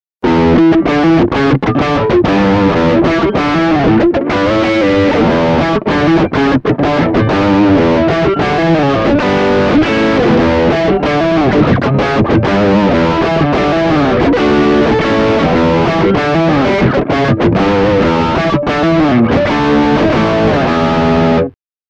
PAF Neck Drive

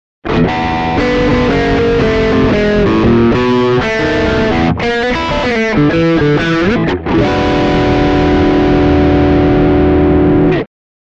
neck bridge, mp90

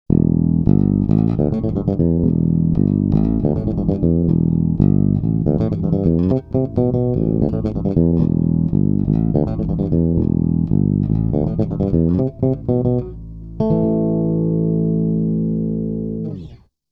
JB bridge

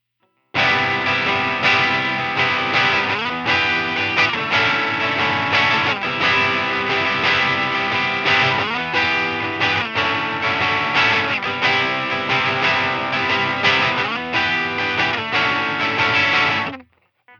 Breakup, Fender Twin Reverb; EQD Plumes

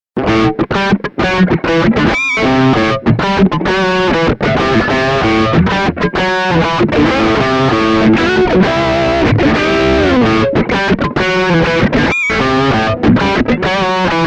Neck Drive

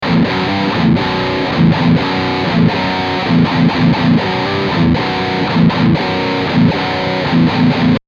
BRIDGE drive; eclipse active; line6

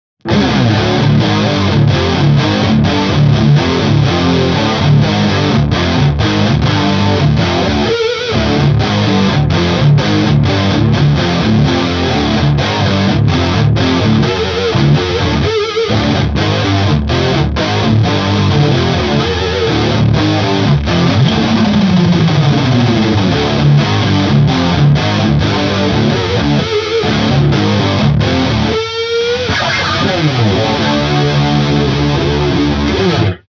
lead, hellfire; kelly

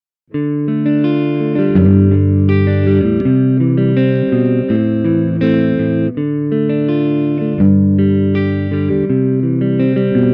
PAF Neck

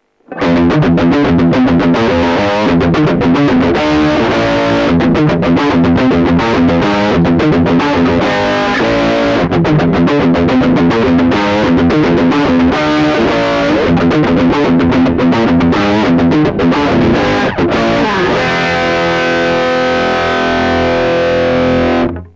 eclipse neck drive; tech21

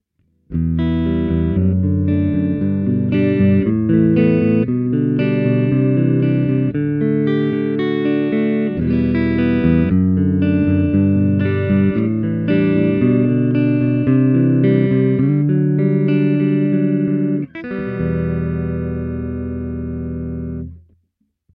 Neck Clean

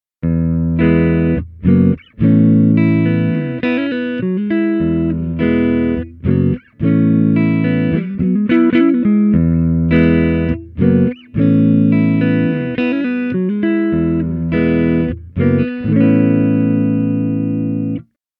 Neck Clean

